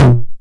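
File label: 909 mid tom tape saturation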